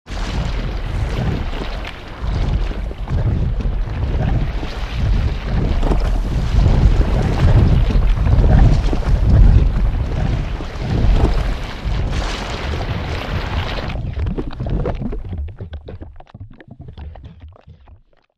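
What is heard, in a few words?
Lava Low Fluid Movement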